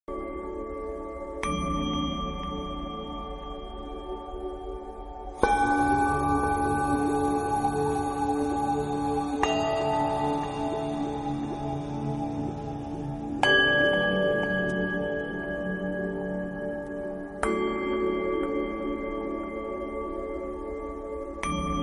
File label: Peace Meditation Music; saumya yoga; Somya